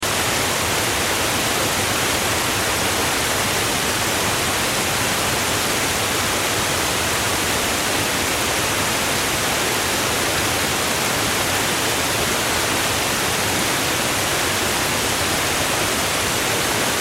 Waterfall River, Waterfall River Run Flow Loop; Waterfall River Run Flow Loop Large; Nature; Blastwave

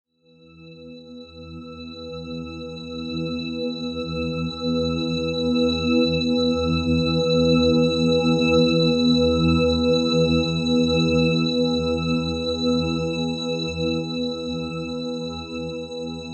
Horror Pad; Scary Soundscape Sound Effects

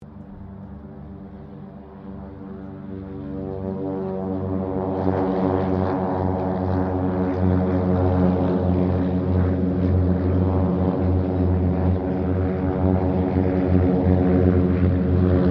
CT4 squadron 5 takeoff, Squadron of 5 CT4s; Airplanes; Sound Effects:Vehicles

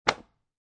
Foley, Book, Paperback, Dropped On Carpet; Objects

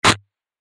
Crunchy Hit Impact; Miscellaneous Impact Sound Effects; Crunchy Hit Impact 03

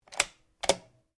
RIFLE CZ 452 VARMINT 22 CALIBRE BOLT ACTION CLOSE; A selection of domesttic firearms; Rifles

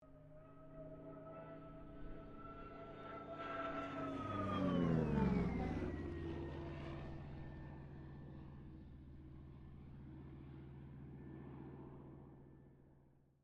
AIRCRAFT CA-13 BOOMERANG PASS STEREO

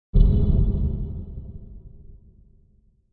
Flame with Tail; Flame sound for video editing, movements, logos, flash, or animation; Sound Design

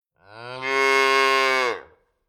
Nature Animal Calf Baby Cow Mooing Single

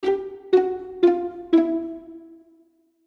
String Plucks, String Plucks Descend; Cartoon; Musical; Cartoons; Blastwave

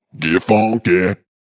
Vocal Deep Get Funky 110, Funk vocal loop sample; 110bpm

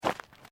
FOOTSTEPS SNEAKERS UNDERBRUSH STEP; Footstep Foley; Human

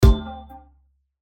button hit; Multimedia; Buttons

